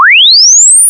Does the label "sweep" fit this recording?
Yes